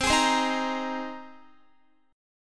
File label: C Minor 7th Sharp 5th C Guitar; Cm7#5 strummed; x,15,18,17,15,17